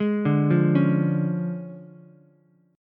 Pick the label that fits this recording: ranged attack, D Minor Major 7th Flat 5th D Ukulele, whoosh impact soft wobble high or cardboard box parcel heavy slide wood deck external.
D Minor Major 7th Flat 5th D Ukulele